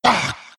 pain; lich

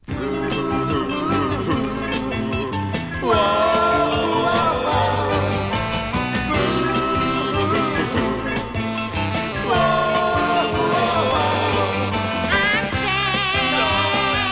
Sad sample

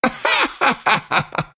laugh; disruptor

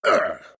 pain; night stalker

